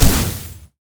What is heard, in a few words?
sonic wave; LoL; leesin; blindmonk